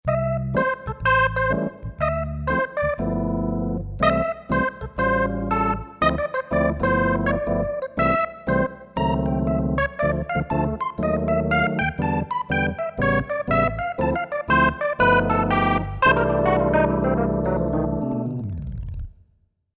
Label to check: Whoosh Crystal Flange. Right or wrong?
Wrong